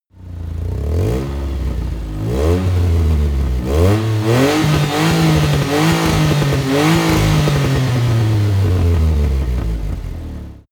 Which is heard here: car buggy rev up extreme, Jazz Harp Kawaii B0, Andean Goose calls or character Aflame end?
car buggy rev up extreme